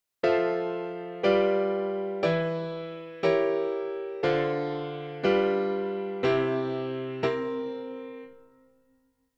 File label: piano